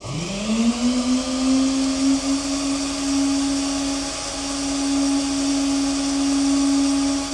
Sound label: P-51D cockpit actuator oil, aircraft; DCS